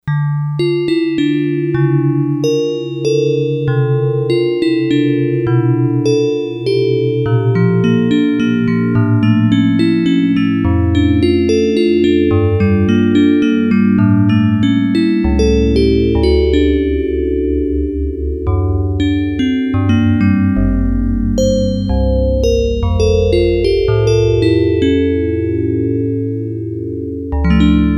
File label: Vibrabelle, Yamaha DX100; Factory Patches